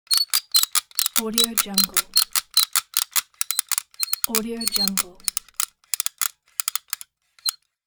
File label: tightening bolt